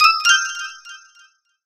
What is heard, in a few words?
Save Completed; kids park kaitou sentai lupinranger vs keisatsu sentai patoranger